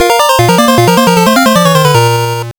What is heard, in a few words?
arcade game over; track field